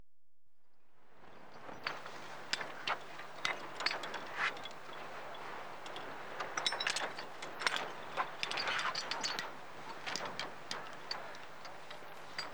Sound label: Abseil From Climber's Perspecti, Adventure Sports; BBC Sound Effects Library